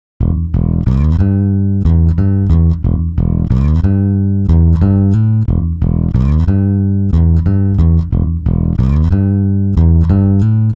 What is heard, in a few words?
bass; apple logic pro monophonic; timestretch